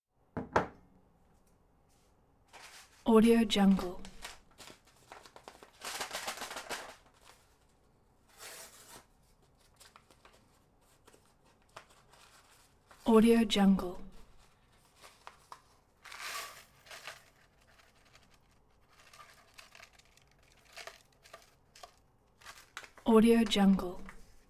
cooking soup begins to boil softly

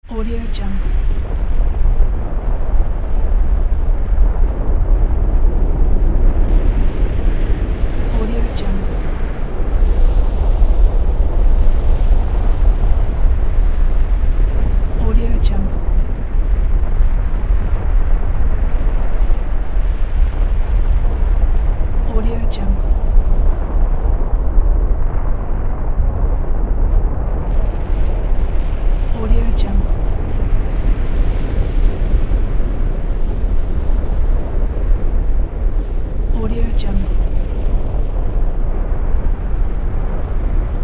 volcano erupting

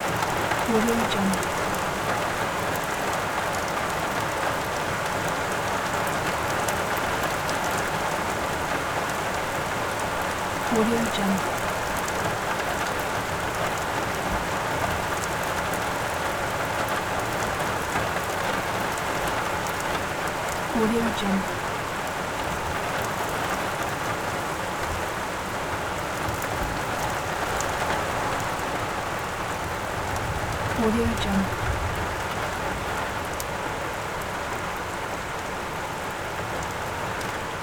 rain ambience

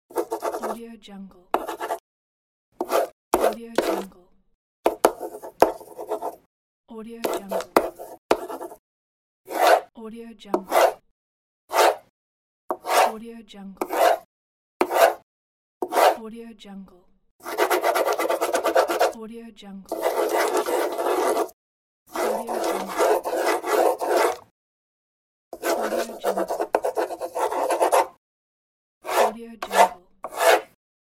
pen write

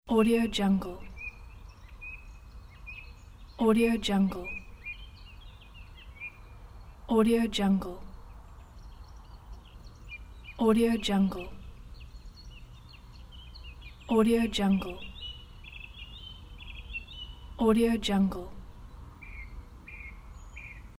birds in the woods

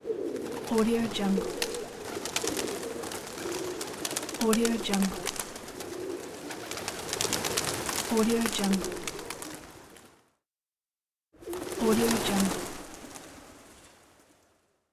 street doves flapping wings

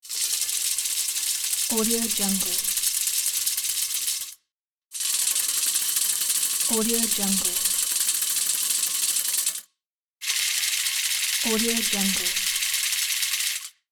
airport flip board

